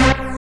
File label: BIP, Synthy Tonal; Distored Reality